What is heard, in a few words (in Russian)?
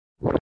glotaet